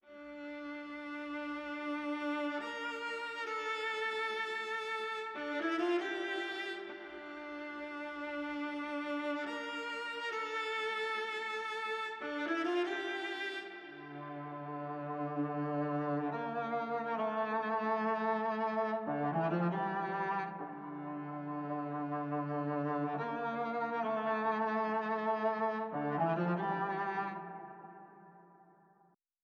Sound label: 140 BPM D Min Violin, Drill; Melodies; Loop Stems; Armed